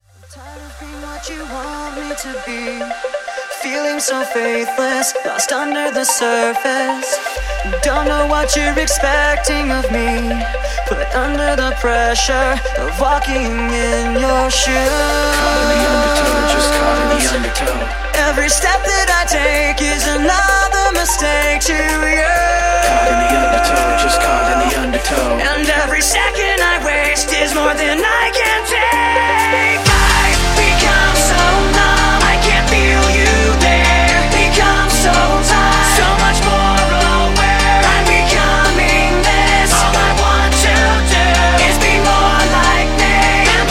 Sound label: EDM Redrum Intro; Remixfreakz